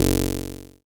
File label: ELKA, String Machines; ELKA RHAP